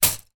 leisure game pick up sticks wooden sticks down on surface; zapsplat